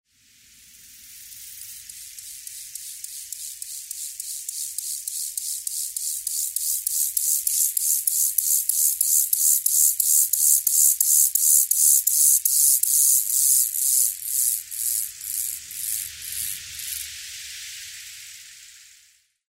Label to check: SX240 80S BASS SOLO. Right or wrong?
Wrong